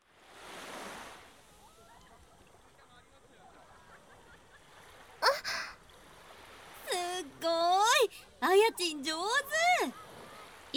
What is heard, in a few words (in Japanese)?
浜辺のおっぱいマッサージ